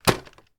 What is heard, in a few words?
toy robot put down; sound effects one